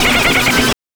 VOX LAUGH; NES DPCM; MIDINES

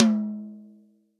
REAL DRUMS POP KIT

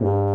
G2 TUBA

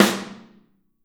SN OH L, Kit OH Mic, Drums Percussion

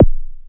BD, Drums Dance & Groove

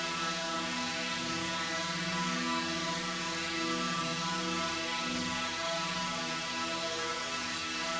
Drone; stereo toolkit samples; Non Tempo Loops

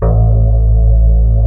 STRIA, Twisted Textures; STRYNGI

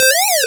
Synths Root C

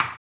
HAMBONE; ILIO; Vocal Planet; Blues & Soul

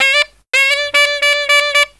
SAX, Killer Brass Riffs; USB Soundscan vol.29 - Killer Brass Riffs; AKAI

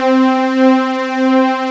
FAT SYNTH, KORG O1/W; Rubber Chicken Software Sample Bank

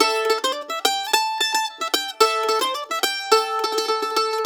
LICK, Blues Guitar; ILIO; Fretworks - Blues Guitar Samples

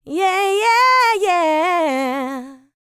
LICKS, Vocal Planet R&B Dance; Spectrasonics; LT R+B

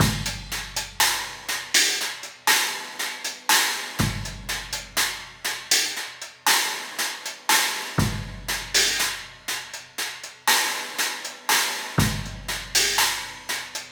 Cymbal and Kick

